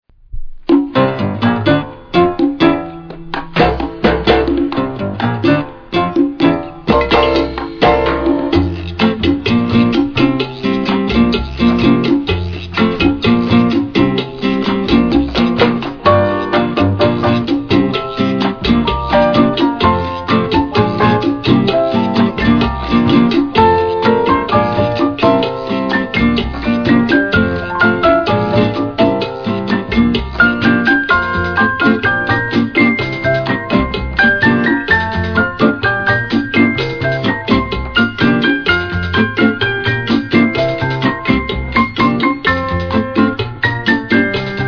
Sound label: piano